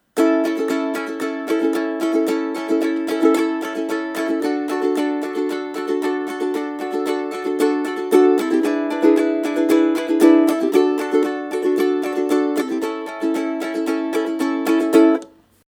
Main Strum, ukulele

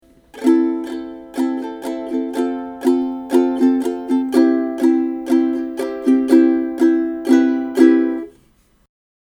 Verse Strum, ukulele

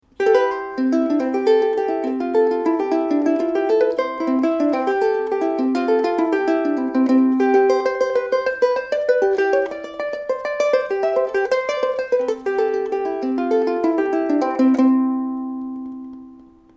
kala pick, ukulele

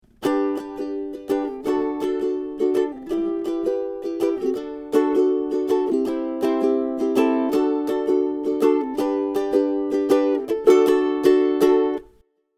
Strum, ukulele

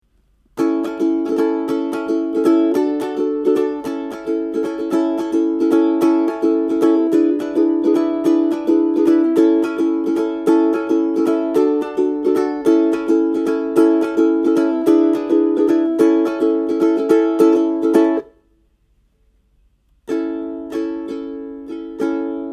Strum, ukulele

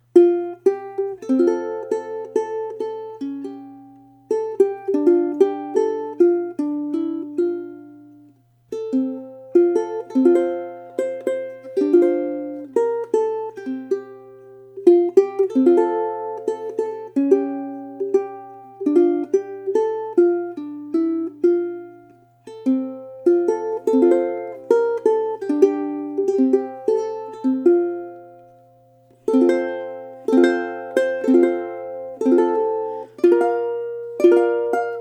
Waltzing, ukulele

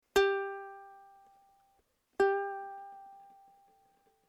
vib, ukulele

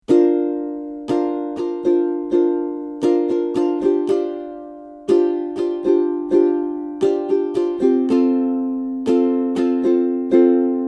Strum, ukulele

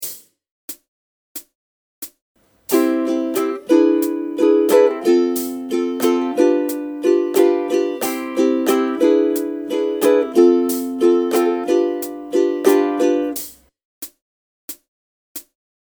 Strum, ukulele

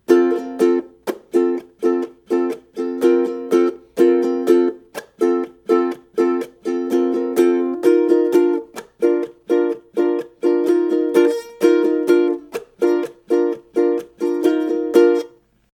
Verse Strum, ukulele

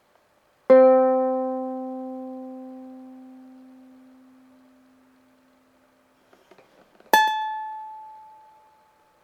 sustain, ukulele

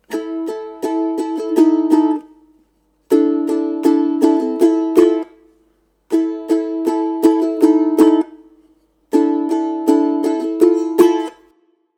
strum, ukulele

